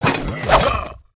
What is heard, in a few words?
STONEKNIGHT WAR ATTACK